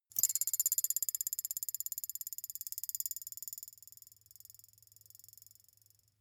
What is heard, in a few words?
thin metal boing vibrate long